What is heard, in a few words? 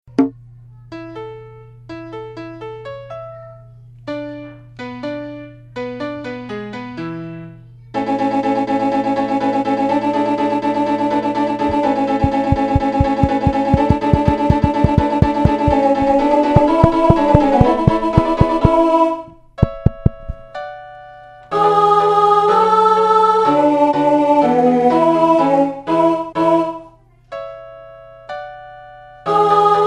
mozart alto; lyon